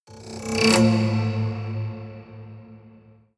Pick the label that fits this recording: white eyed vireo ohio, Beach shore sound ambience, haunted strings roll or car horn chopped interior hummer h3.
haunted strings roll